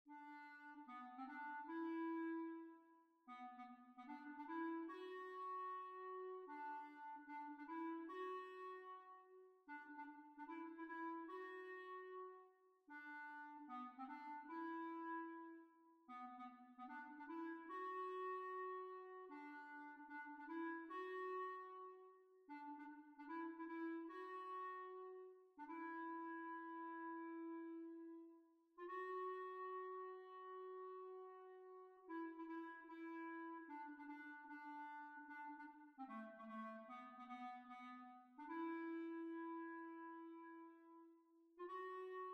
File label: Kirkgate Hornpipe B flat